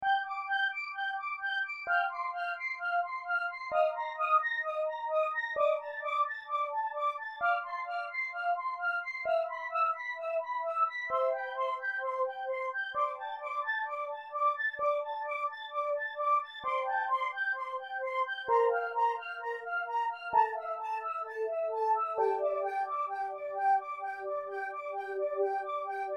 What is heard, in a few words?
xp80 flying waltz